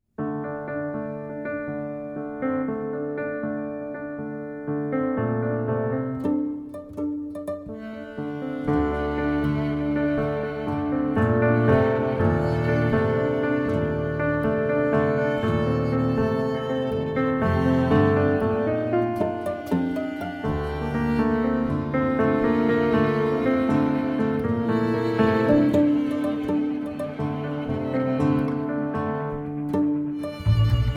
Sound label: Hit The Road piano